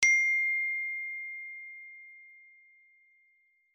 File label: iphone xmax note ringtone